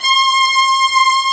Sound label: strings violin, violins; Roland LCDP13 String Sections; STR Vls4 6pc